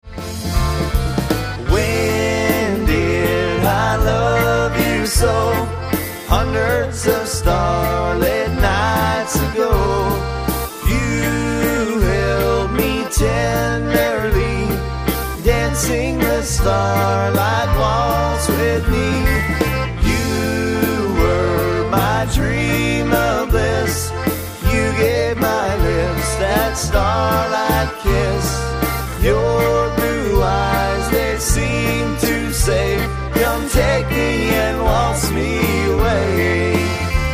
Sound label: Waltz